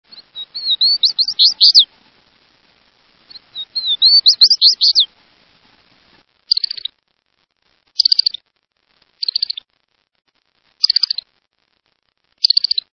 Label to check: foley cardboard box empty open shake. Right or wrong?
Wrong